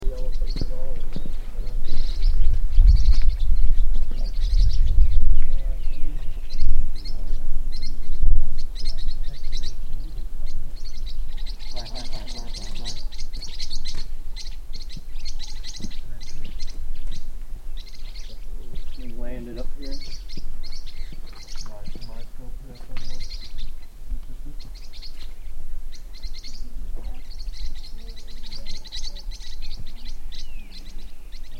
Yellow faced parrotlet

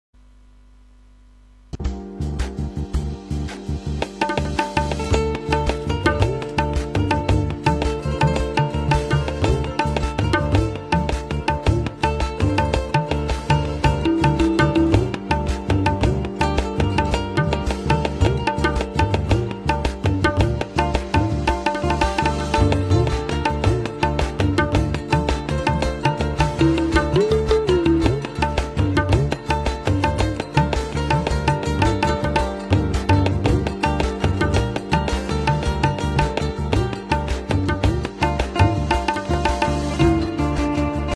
Percussion

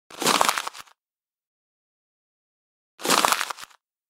Opening paper roll

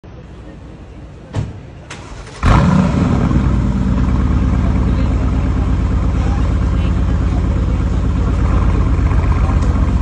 Aston Martin, اگزوز

